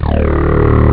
d50 bass chord; steel; ST-XX Amiga Soundtracker Sample Packs

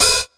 Lo-Fi Quick Open Hat

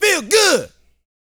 VOCAL, R&B Dance, HIP-HOP

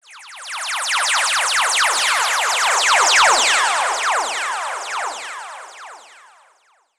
sweep, SCIFI; Techno Trance Essentials

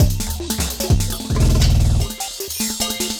Mechanic Beat, Drum N Bass; Sonic Foundry (Sony Creative Software); Knutrix Drum & Bass

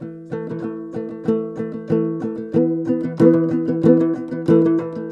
TOPSHUR; Soundscan vol.60; Asian Traditions; AKAI

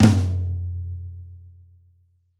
Rock Flam Tom, Roland BK-5

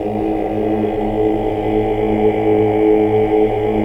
OOH OCT

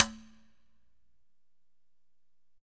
Congas wet, drums percussion

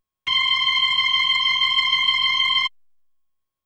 B3 Organ; Masterbits CLIMAX 8

